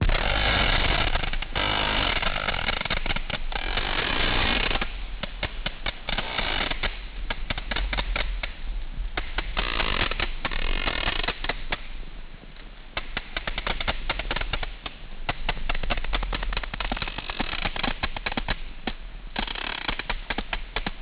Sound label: codas, whale songs